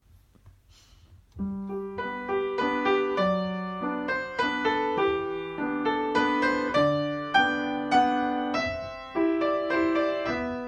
soprano

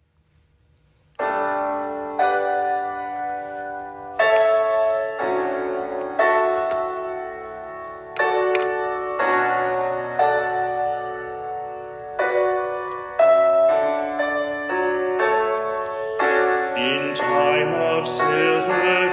tenor

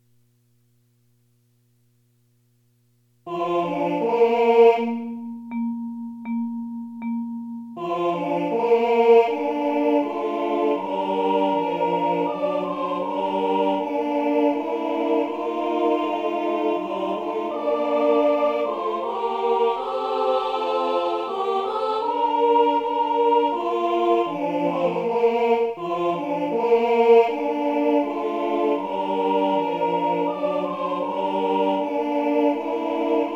star spangled banner quarter 80

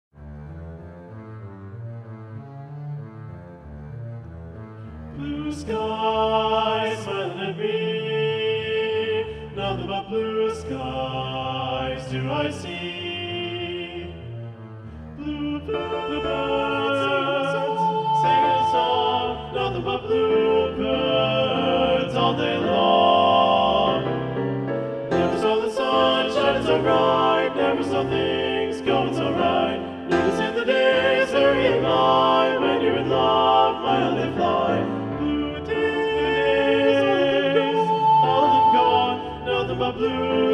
balanced voices

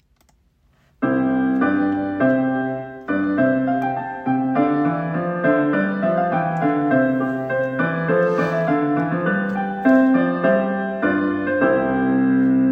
tenor

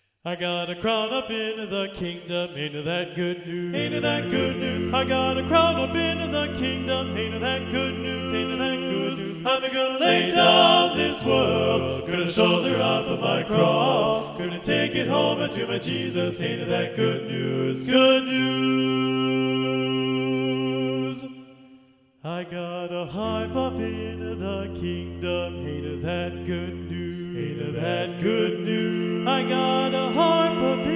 good news ttbb balanced voices

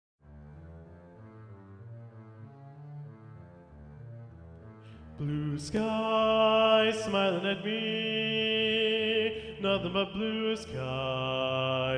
bass predominant

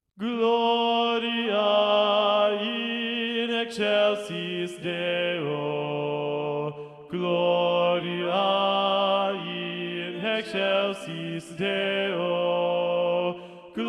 gloria in excelsis deo tbb bass 2 predominant

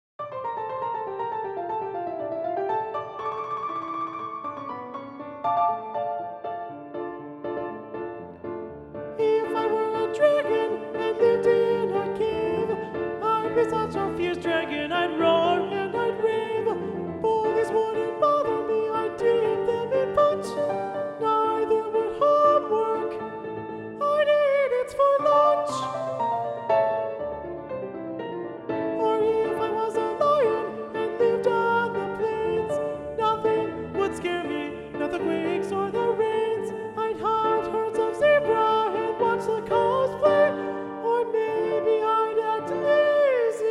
unison balanced voices

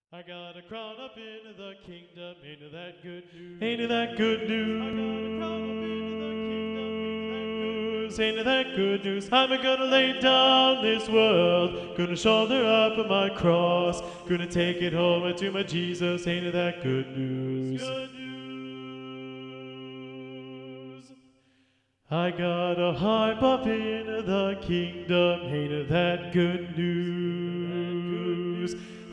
tenor 2 predominant, TTBB

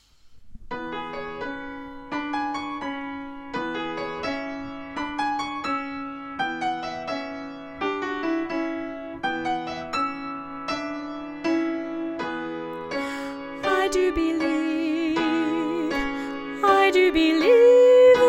out of the fog alto

